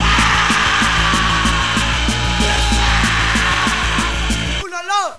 bombe; de cavapeter

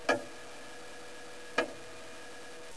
comtoise balancier; cs manoir

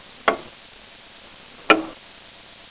horloge

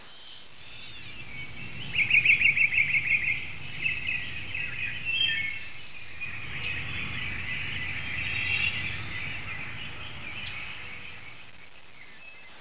oiseaux; cs villa2003

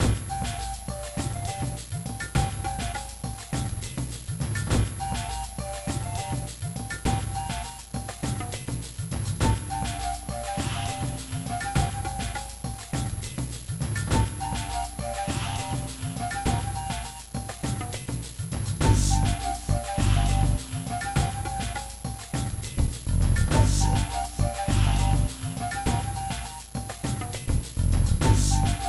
musique

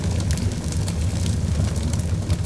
feu

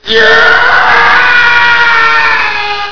cri; imperial base

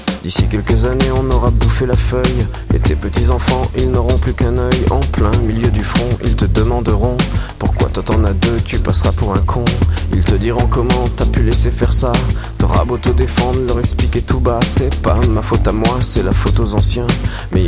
respire

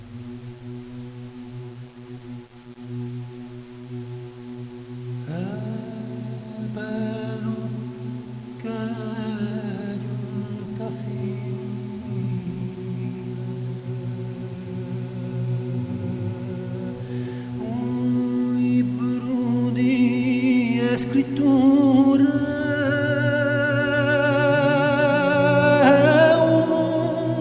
chants; olympe

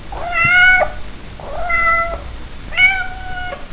chat

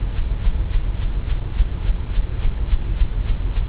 ventilateur

